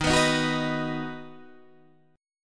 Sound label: Eb Minor Sharp 5th, down, Guitar, Standard; Ebm#5 strummed; JGuitar